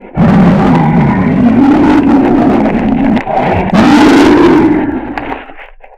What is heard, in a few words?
huge angry, aliens; ufoai